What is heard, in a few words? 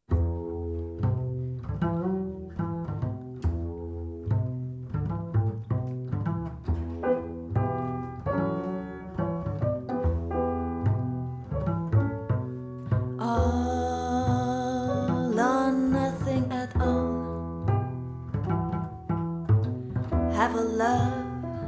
trio swing